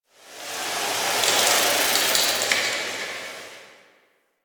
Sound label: Tower Rustling Branch; Warframe; Quests; TheNewWar; Ambience; Cetus; Unum Tower